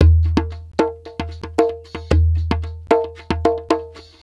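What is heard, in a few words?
percussion, Roots Disco; USB Soundscan vol.32; AKAI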